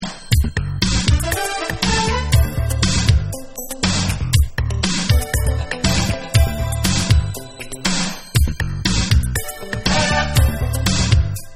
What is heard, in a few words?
Playback Beats